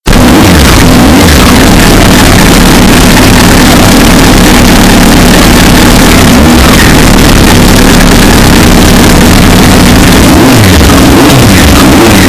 hornet cortando giro

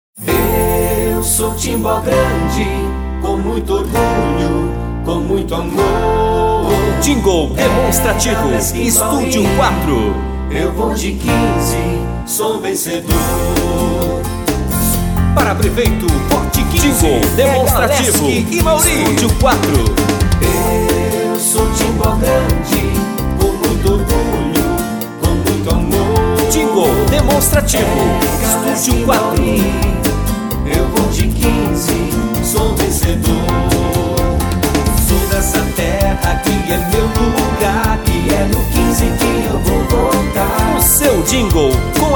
jingle; jingle politico 2020; eu sou timbo grande prefeito

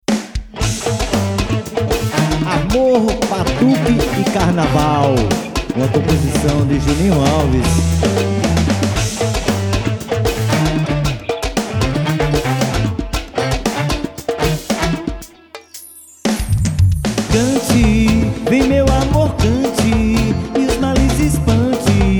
amor batuque e carnaval

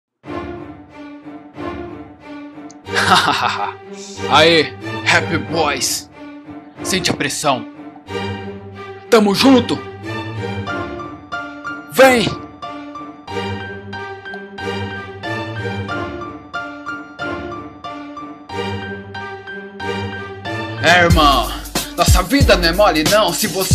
rap

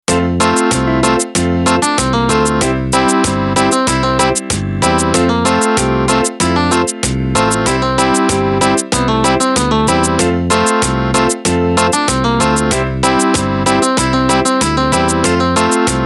instrumental

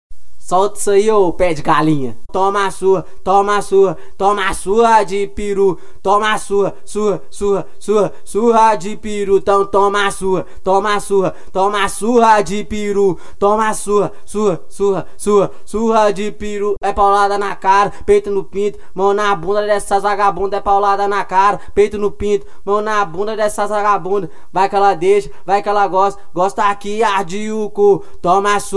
acapella

